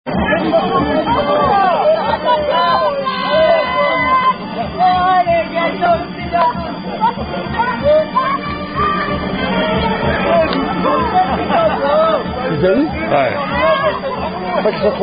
[French Rugby Tradition]

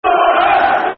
Who Are Ya, Football Chant; Man United

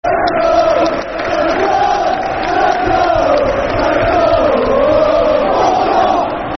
Time To Go, Football Chants (Soccer Songs); Bolton Wanderers Bwfc

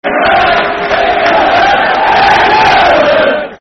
Football Chant (Soccer Song); Engerrland; England

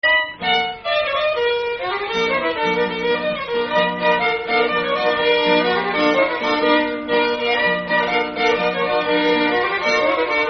6 8 B Flat F; Nelson; Nameless Tune

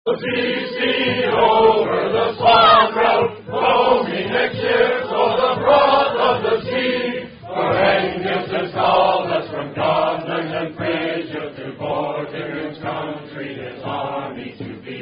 Songs; Song Of The Shield Wall; SCA Songs

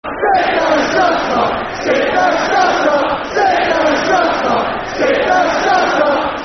Sit Down, Shut Up football chant (soccer song); Brentford

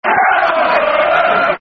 Come On Fulham, Football Chants (Soccer Songs)

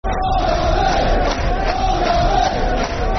Barmy Army, Football Chants; Sheffield Wednesday Swfc

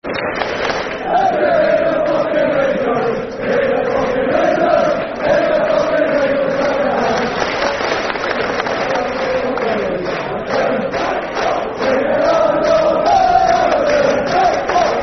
Football Chant (Soccer Songs)

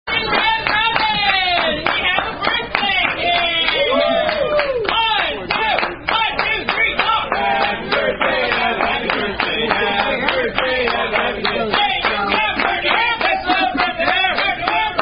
Red Robin Happy Birthday (Tune Alouette)

